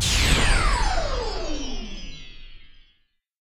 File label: bonus; Alawar; Crusaders Of Space 2